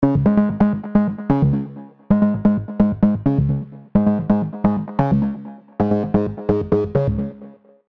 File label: Bass; Arturia KORG MS 20 V; Dist TUBE CULTURE; Look Mum No Compressor